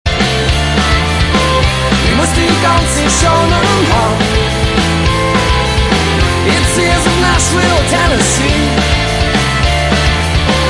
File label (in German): Country Song